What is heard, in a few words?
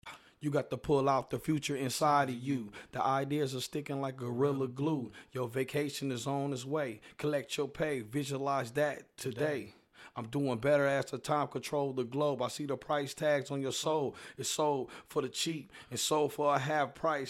hip hop, 96, Dm, acapellas; I Been There